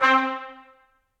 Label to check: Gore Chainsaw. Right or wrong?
Wrong